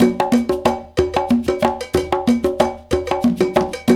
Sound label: Bembe 6 8, World Dance; Spectrasonics; Supreme Beats